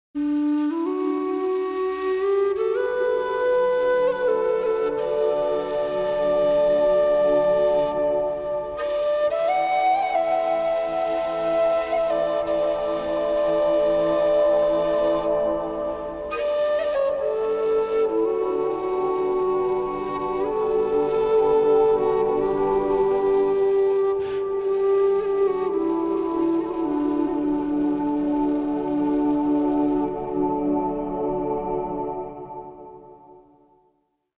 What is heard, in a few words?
backing tracks, Bagpipes; Aisling Gheal